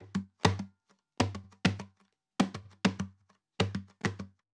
guitar percussion 100 bpm; bespoke - Floral v.1

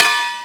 Mech hit; SFX; One shot; 7rystan's 600+ FREE EDM SAMPLES & DRUMS

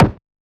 kick; bespoke - Floral v.1; drum oneshots

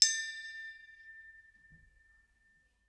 percussion; bespoke - Floral v.1

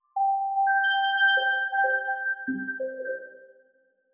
glitch C maj; bespoke - Floral v.1

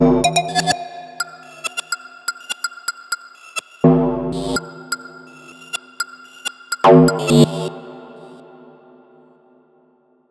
Dark Percussion; SFX; 7rystan's 700+ FREE COLOSSAL EDM SAMPLE PACK

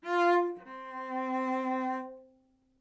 live string phrases, string phrase Cmaj 100bpm

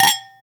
Glass clink; Foley; SFX; 7rystan's 600+ FREE EDM SAMPLES & DRUMS

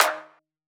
tonal clap (Eb); drums; drum oneshots; bespoke - Floral v.2

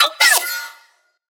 Synth

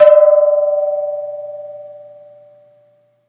Soda can hit; Foley; SFX; 7rystan's 600+ FREE EDM SAMPLES & DRUMS

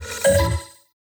synths oneshots; utro; base pack

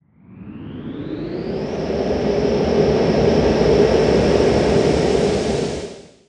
Scifi Engine; SFX; 7rystan's 700+ FREE COLOSSAL EDM SAMPLE PACK

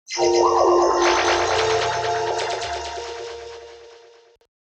melodic fx digital doodad Ab Maj; base pack

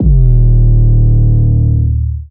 Trap bass, EDM; 7rystan's 600+ FREE EDM SAMPLES & DRUMS

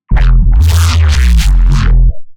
bass neuro E; Anthesis; base pack

